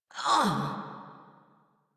Pain, Battle; Warframe; Grineer Catbrow Handler

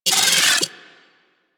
Arachnoid Micro Vocal Attack; Warframe; Corpus

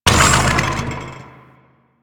Lift Move Stop; Duviri

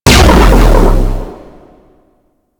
Buff Burst; Warframe; Syndicates; Cephalon Suda

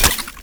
Flux Rifle Reload; Crp Laser Rifle; BE Flux Rifle

